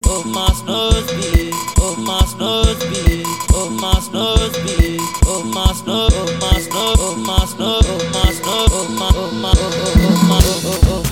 Free beat

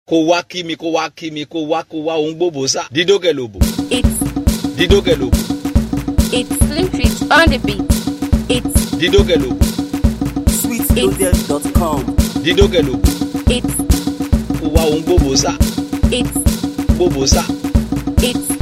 Beat

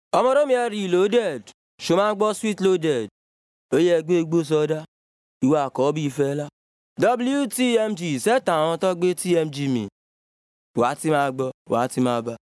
Jingle; Reloaded

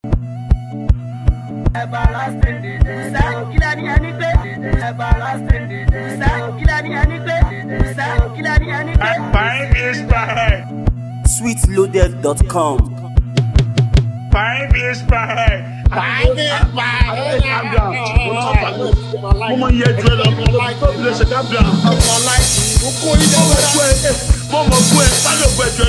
Free Beat

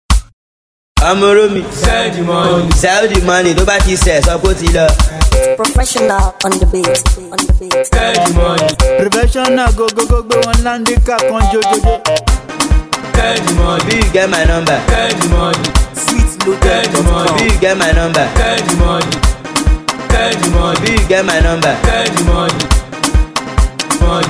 Professional beat

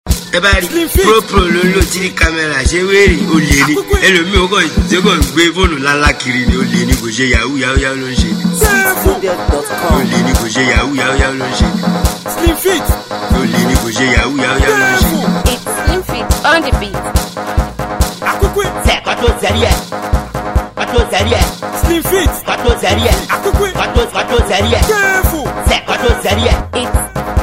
Refix Beat

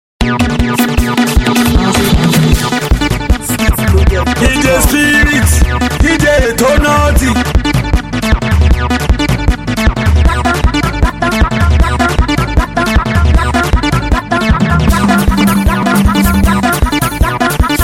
Mara Village Beat

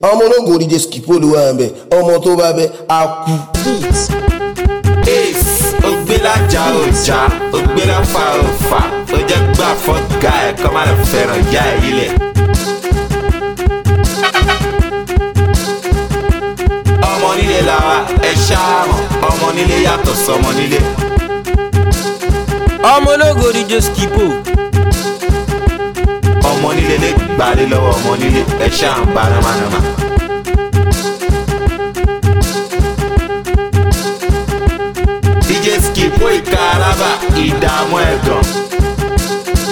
MARA BEAT

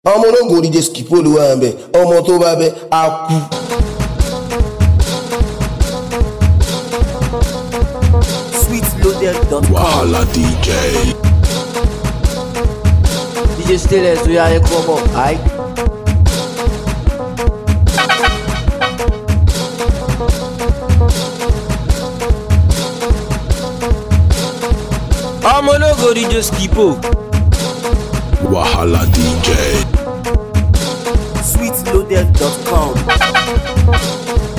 BEAT

